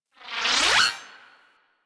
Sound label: Hub Cephalon Statue Appear; Warframe; Ambience; Tenno; Syndicate Cephalon Suda